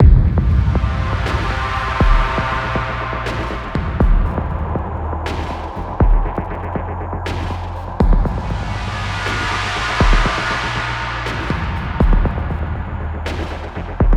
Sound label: Glitch Slap Slow Low